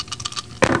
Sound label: dice